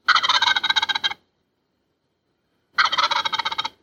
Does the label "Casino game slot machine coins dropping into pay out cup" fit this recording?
No